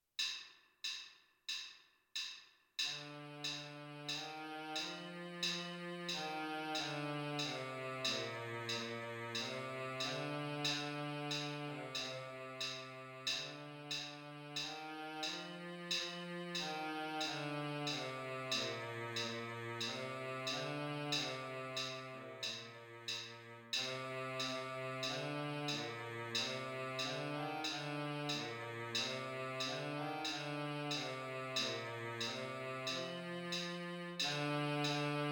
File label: baritone sax